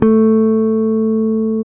E-Basses, Roland

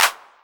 808 Clap; Drums

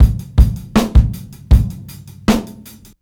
beat 79bpm, Hip-Hop Street Beats; Construction Kit; Street Beats by Poogie Bell; Queens

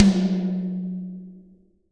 toms, Real Mega Drums

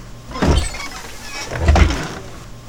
swinging door; E-MU Producer Series Vol. 3 – Hollywood Sound Effects; Human & Animal

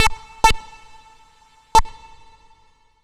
MR SYN 1 R, Roots Funk; USB Soundscan vol.41; AKAI

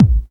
KIT, Dance Mega Drums; Best Service

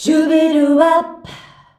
"SHUBIWAP" E, FEMALES

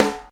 Bark, Snares; Airwindows; BeyondSnares

